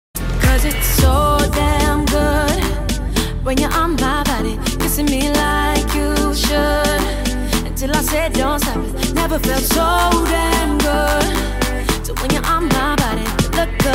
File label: English Song